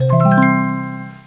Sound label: Organ; Revelation Sight and Sound SK